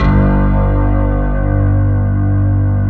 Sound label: PNO HEAVN; EDENPNO